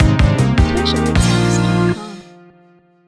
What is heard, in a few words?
New Wave Follower Alert